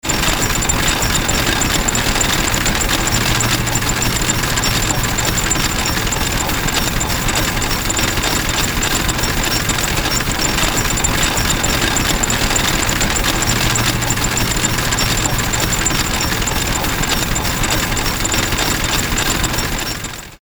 steam engine

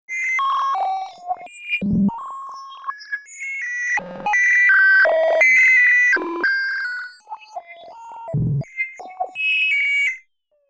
random calculation

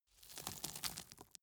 gravel drop soft short

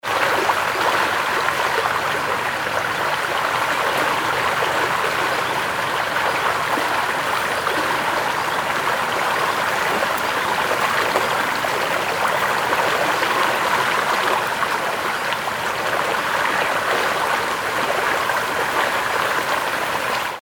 danube river

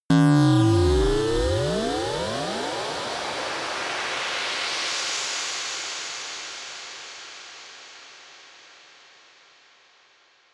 creaking noise riser

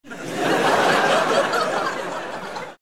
laugh

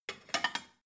plate cupboard take

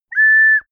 ocarina unstable high short

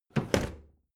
bathroom drawer open heavy

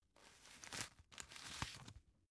tissue take soft

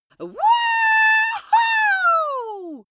wuhu over excitement female long

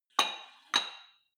weight rack insert weight multiple

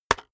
keyboard external enter hit very fast

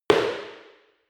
impact hard indoors short

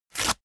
sheet crumpled tear up fast short

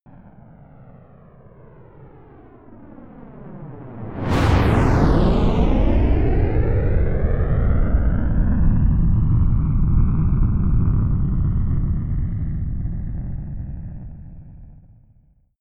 spaceshuttle rocket flyby fast

